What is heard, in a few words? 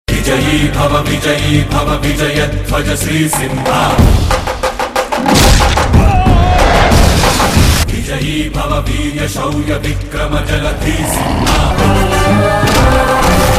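Background Music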